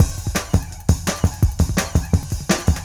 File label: CYCLONE KIT, PROCESSED ACOUSTIC DRUM KIT; Sonic Foundry (Sony Creative Software); Vir Unis Infusion